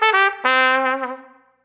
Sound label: Trumpet Riff; Swamp; Music Ray